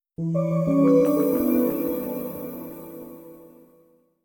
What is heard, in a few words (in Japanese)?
サウンドロゴ; マイクロソフト; 不採用作品; I.E. 4.0